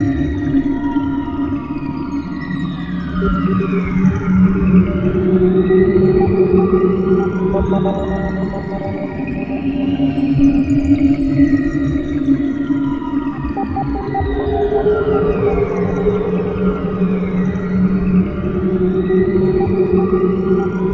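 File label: ambient, ambi drones; Best Service ProSamples vol.36 - Chillout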